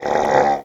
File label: zombie hit; wesnoth